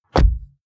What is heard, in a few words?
car bmw e46 interior door rear close; Cars